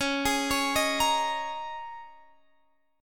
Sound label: Eb 7th Suspended 4th, Db